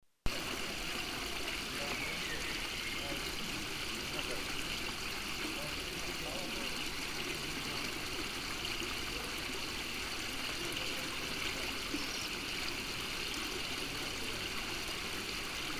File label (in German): Altstadt mit Musik